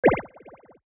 Bubblebeam

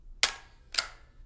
Stapler

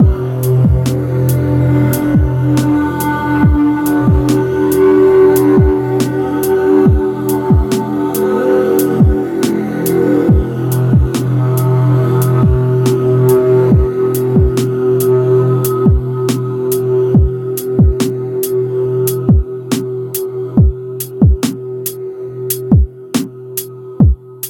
Drumbeats Melodic Ambient Beat 70 Bpm

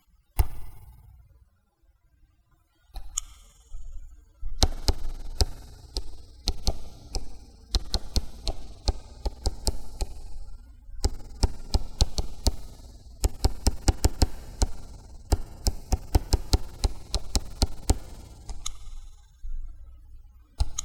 Tablet Typing

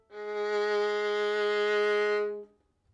Violin G 4 Tenuto Vibrato

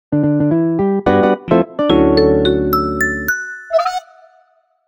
Cheesy Lofi Intro

